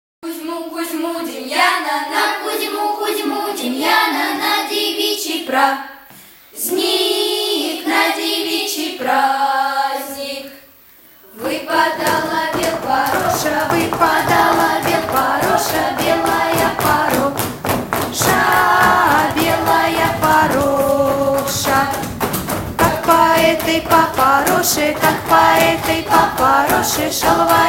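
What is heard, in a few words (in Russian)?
folklornyy ansambl, narodnoe penie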